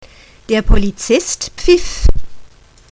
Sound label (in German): pfeifen; PFEIFEN : der Polizist pfeift